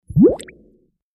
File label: Bubble Pop Rising Wet; bubbles